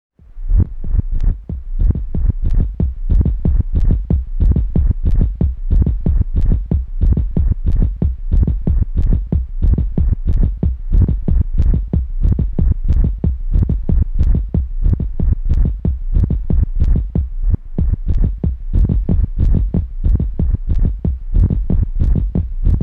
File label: beat session